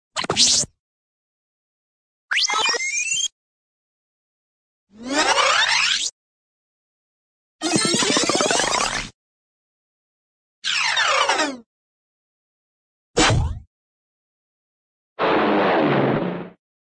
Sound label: zip, cartoon rewind; fijit